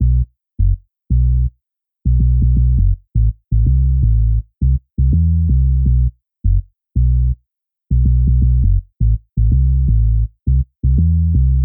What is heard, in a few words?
Reggae